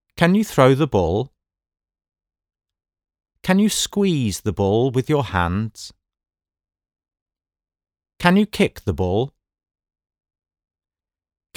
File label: ball